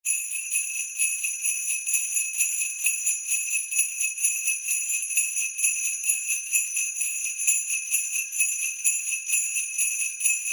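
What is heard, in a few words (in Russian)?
bells ringing on a sleigh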